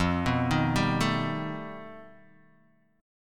F 9th Flat 5th F down Guitar Open D

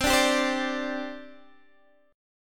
C Suspended 2nd C Guitar DADGAD; down; chord shape

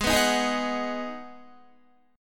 Ab Minor 7th down Guitar; Abm7; strummed

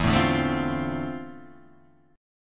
D-Minor 7th, E; Dm7/E; strummed

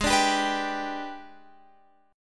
G sharp Minor Major 7th Flat 5th, Guitar Open F; strummed; JGuitar

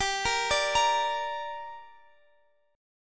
G Minor G down Guitar Drop D, chord

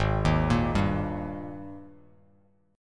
G sharp Major 7th Suspended 2nd, Bass Standard; 4,6,8,0; JGuitar

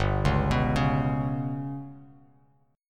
G Major Flat 5th Bass Standard, chord; GMb5 arpeggiated; JGuitar

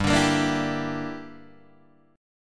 G Minor 6th, Guitar Open D

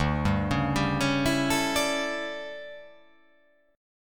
D Major 7th Suspended 2nd Suspended 4th D down

